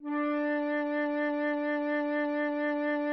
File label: flute D4